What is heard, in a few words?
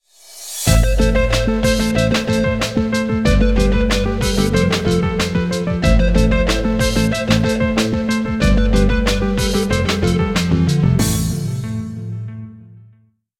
Race Lose; A.I.M. Racing